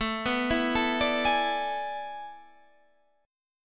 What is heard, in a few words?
A Major 7th Suspended 2nd Suspended 4th, Guitar, Standard; arpeggiated; capo 3; 17,14,14,14,15,16